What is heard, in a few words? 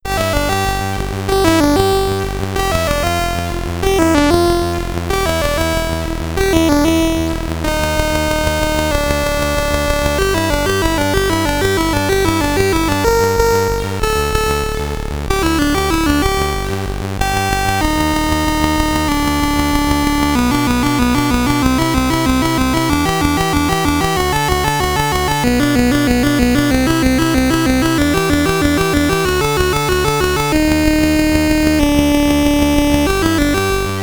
SID Stereo